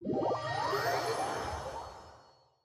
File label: login